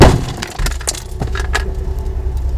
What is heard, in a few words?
impact wall light; Kart